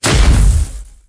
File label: Electric Ball Hit; god awe full clicker 2018 gamerip